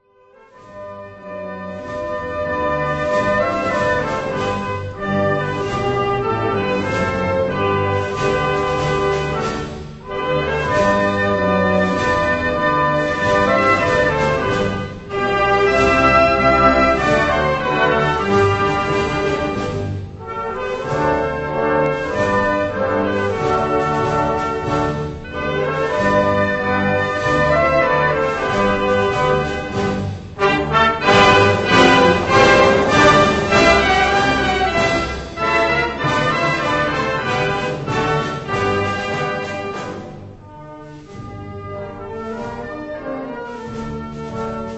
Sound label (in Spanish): himno orquestal